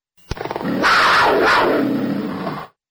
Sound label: Cat roar